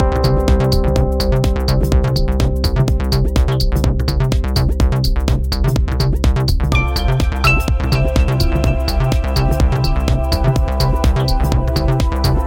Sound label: Warm Trance